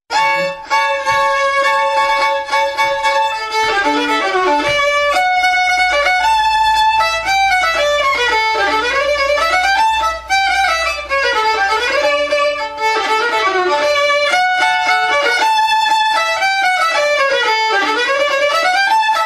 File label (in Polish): oberek